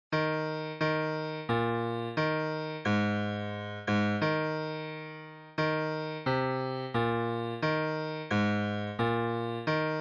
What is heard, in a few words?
Bass